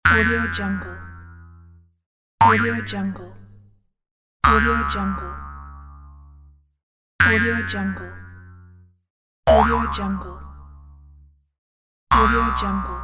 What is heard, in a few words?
cartoon jumping pack